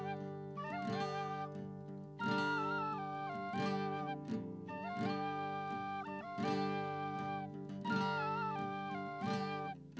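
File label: no drums, instantaneous mix; SiSEC11; underdetermined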